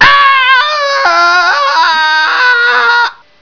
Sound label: SCREAM